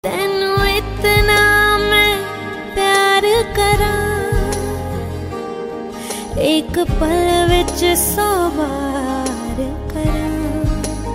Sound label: female